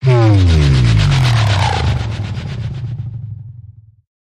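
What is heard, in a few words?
Power Down Motor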